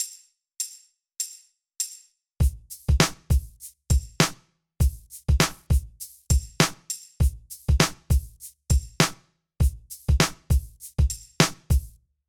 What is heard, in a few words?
Hip Hop Loop 100bpm Wet